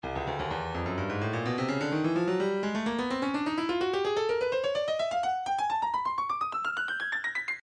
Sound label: breakbeat 127 bpm; Trixie A; Stylus Breakbeat Midi Files